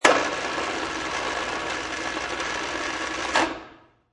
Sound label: Chain Crane, Electrical motor start, lift and lower object; lower object, lift object, stop. Long and short runs; Conveyor Belt Sound Effects; LynneMusic